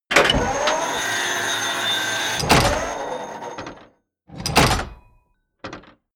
tank large turret rotate

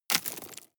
drop stones small

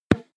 tennis ball hit

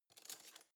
cutlery spoon drawer grab soft

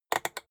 rotary control short quick turn hard

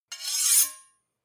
two knives large scrape short

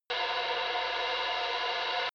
drone spaceship vent

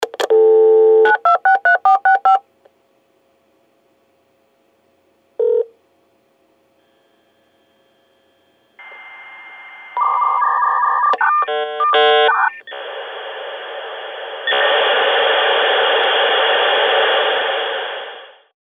computer modem

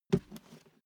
step barefoot metal single left

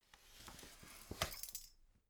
folding chair fold slow

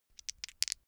dice shake hands open short

